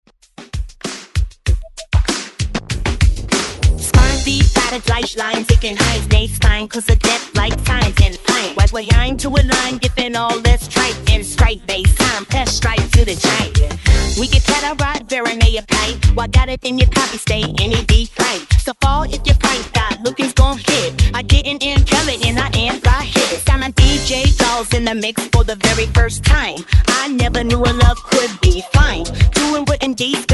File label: 90s Re Drum Remix 97 Bpm; DJDOLLS